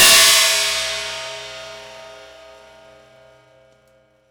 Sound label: Prepared Drums